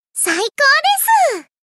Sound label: Skill Activate; Kotori